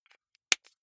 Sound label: marker close lid